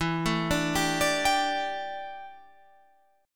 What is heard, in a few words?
G Suspended 2nd E, Guitar; Gsus2/E; arpeggiated